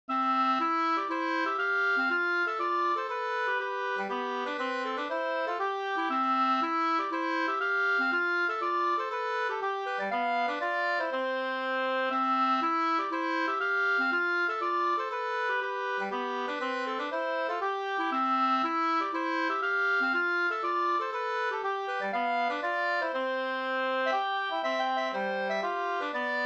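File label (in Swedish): polska, tvåstämmig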